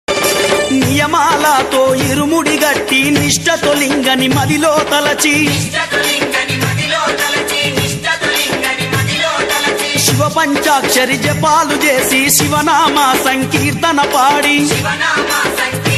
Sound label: telugu folk song; lord shiva; shivayya; bolenath